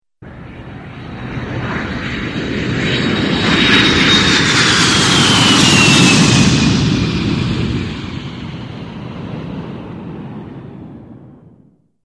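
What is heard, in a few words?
sound of airplane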